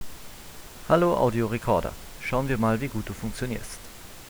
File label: Front Mic In +12 dB